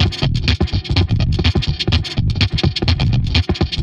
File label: perc 125 crazy, Percussive Loops, 125 bpm; Sample Magic - Transmission-X